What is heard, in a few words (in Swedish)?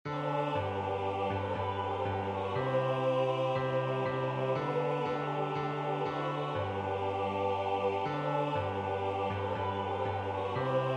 BAS; Steuerlein; pask